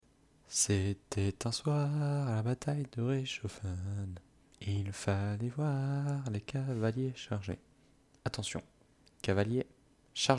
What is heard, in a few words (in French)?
air bataille de reischoffen